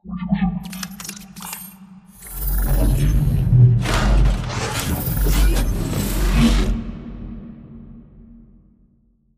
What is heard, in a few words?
pipe idle reverb; bt; beacon; control room; Titanfall 2